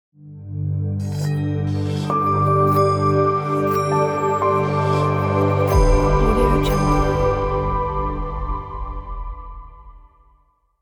tranquility logo; amethistlab

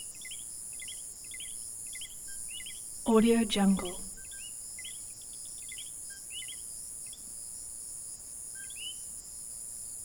jungle frogs

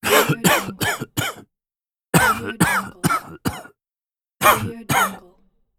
the cough; fx-market

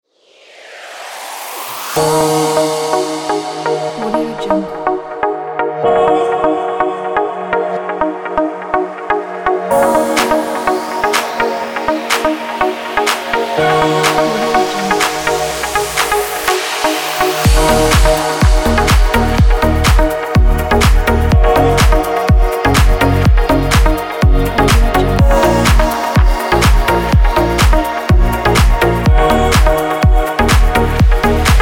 for deep house

